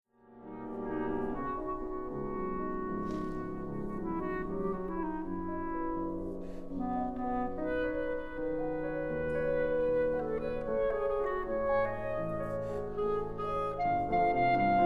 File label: Clarinet Sonata Excerpt